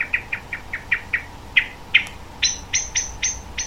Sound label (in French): Merle cri